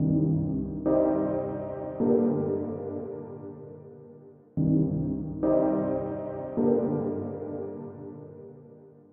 Dubbish

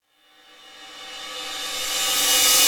Reversed Crash Cymbal Low

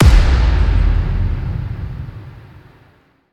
Hit

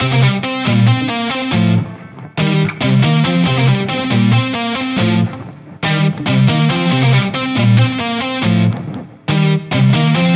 Cell Phone Ring Tone Rock N Roll

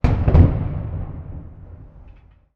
Loud Explosion

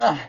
Hurt

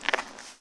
Creature chitter

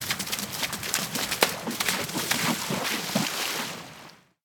Running Into Lake Water

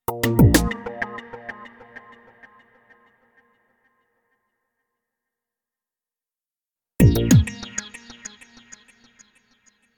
Logo Beat

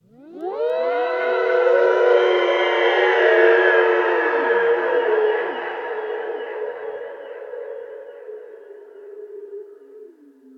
Ghosts Fly Up

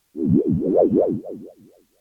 Wobble

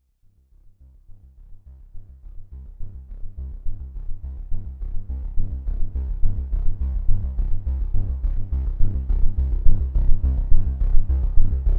Vampires Entrance

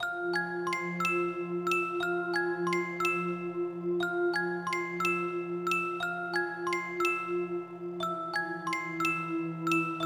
Horror Theme Loop

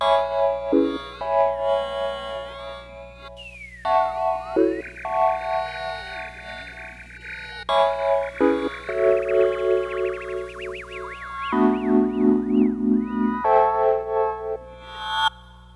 Multi Sfx With Piano 125 Bpm

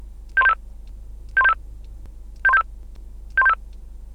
Motoxpr4350 Key up

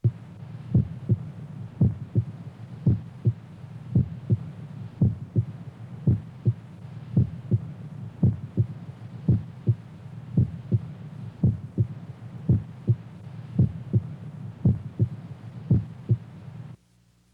Heart Beat

